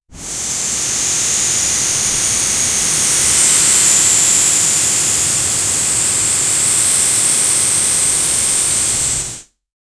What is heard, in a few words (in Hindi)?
balloons me hawa bharne k, balloon